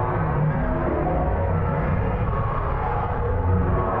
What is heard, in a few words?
Tonal Re Draw, sparse soundscape